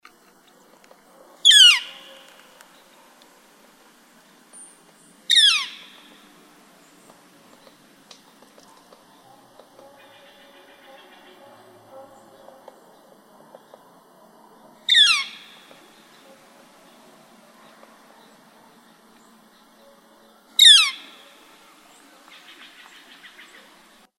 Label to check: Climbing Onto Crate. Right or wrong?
Wrong